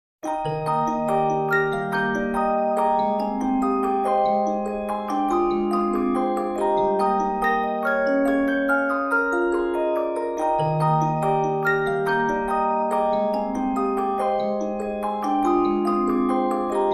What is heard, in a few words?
music box - the beginning tone